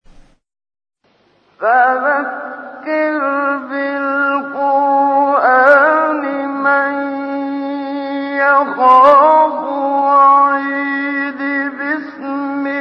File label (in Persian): تلاوت سوره مبارکه; استاد منشاوی; الرحمن